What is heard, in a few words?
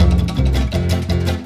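ocean world music cage forcefield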